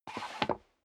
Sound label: step sneaker wood creak scuff; schritte